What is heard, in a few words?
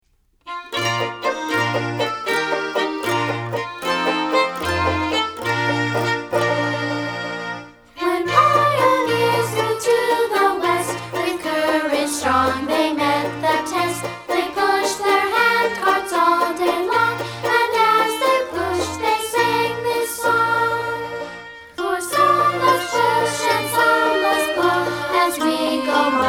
the handcart song words and music